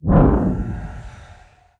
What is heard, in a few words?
basic fire; smite; zhongkui